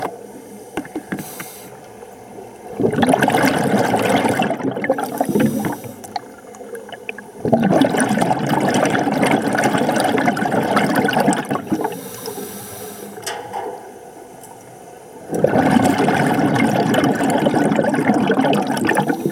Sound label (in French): rhone sub aquatique; arles